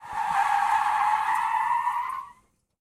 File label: skid asphalt; Blind Drive